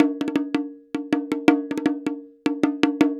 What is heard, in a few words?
PERCS 80BPM; INIS - Opium